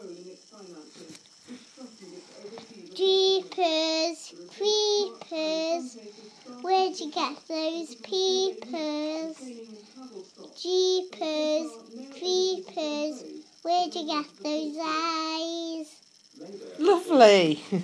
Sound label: small girl sings